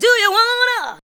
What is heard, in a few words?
ADLIBS 90; Ueberschall; Jam Box